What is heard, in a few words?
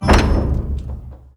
cracked orb